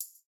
disco tombourine; Electro

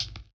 drumbreak hihat

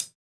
Hihat; urban grooves; Rock

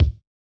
Hiphop kick

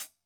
disco hihat; Electro

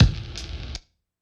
drumbreak kick; Hiphop

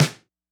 disco snare; Electro

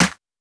Classic hiphop snare

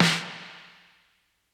80s reverb snare

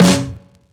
drumbreak snare; Hiphop

